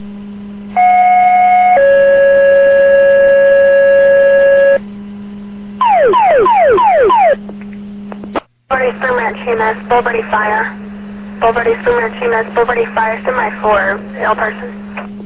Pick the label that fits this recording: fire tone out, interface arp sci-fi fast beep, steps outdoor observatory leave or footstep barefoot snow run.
fire tone out